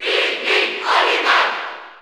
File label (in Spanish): Cheer Spanish; Olimar; SSB4; SSBU